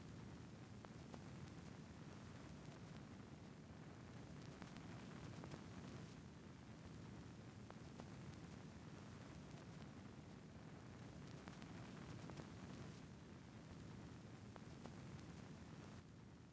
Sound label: 120 BPM B Min Noise, Trap; Melodies; Bazaar